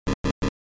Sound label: RPG Enter